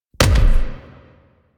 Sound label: Punch; Warframe; Quests; The New War; Weapons; Omicrus